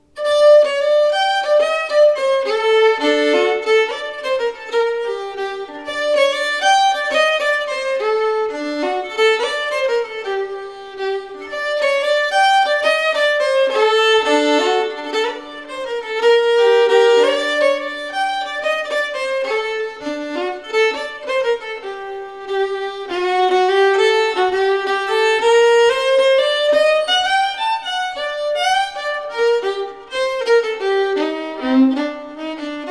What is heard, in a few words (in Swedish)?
Oreganopolskan